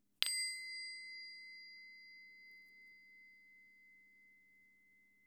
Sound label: small bowl ext sound